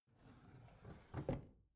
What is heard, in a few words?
bathroom drawer open soft